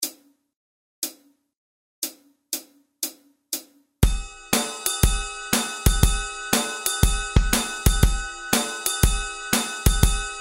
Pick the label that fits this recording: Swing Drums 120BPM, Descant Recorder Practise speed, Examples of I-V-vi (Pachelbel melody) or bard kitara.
Swing Drums 120BPM